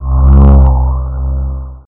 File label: zap; airTaxi